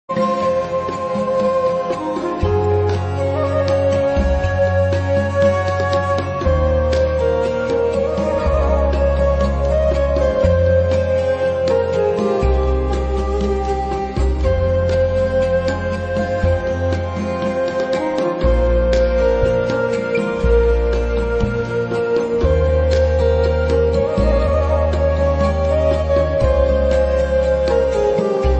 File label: Melodic Modal Medieval Morning; Afterburner